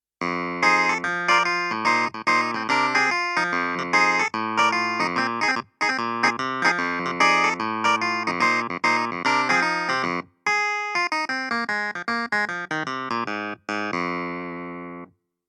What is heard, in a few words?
stagepianos kawai clavinet